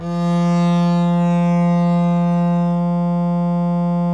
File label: DBL BASS, Synclavier Strings; ILIO